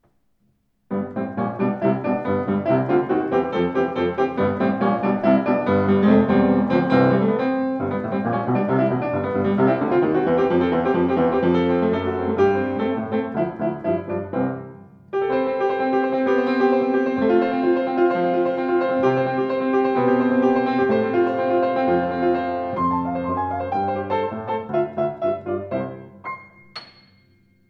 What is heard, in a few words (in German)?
Bechstein 12N Rag, Klavier; 114 bechstein 12n weiss